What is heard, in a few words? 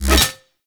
Fishing Spear Line Retract Catch; Weapons; Venus Fishing Spears; Warframe